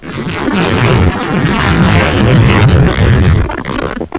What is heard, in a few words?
tearing (balloon)